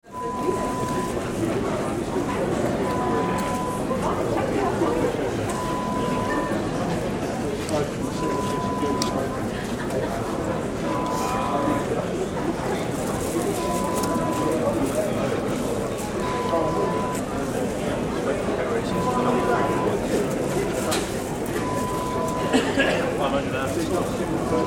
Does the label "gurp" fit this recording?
No